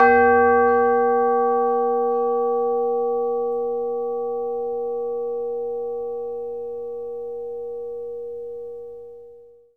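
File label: CHIME A4; ILIO; Synclavier Percussion 2; World & Orchestral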